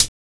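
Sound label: HH, hi-hat; X-Static Goldmine 1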